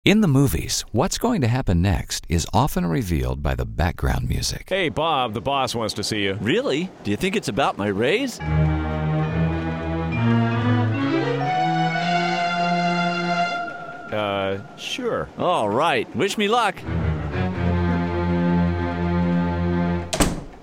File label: Background Music